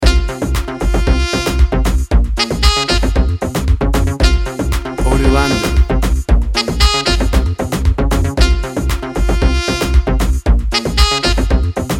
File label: 3D Shooter Computer Game